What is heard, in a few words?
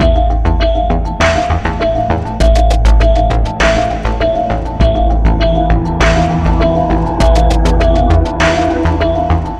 Future Ambient, music